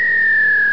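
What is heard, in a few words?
digital brass, keyboards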